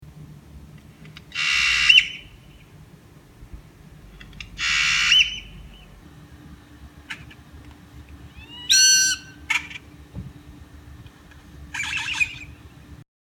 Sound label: bird noise